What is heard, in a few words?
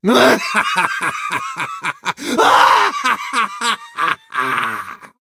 laugh French; Jhin